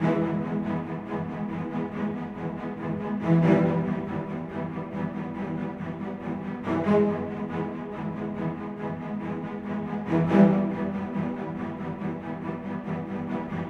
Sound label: E 140 bpm; into the wild